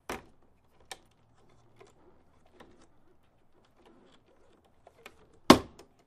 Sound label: Citroen Deux Chevaux, Door Open and Close. Medium Cu.